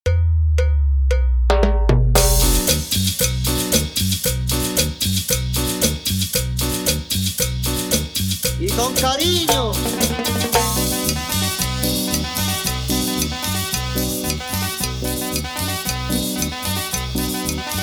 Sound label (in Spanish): Intro, Perc, 114 BPM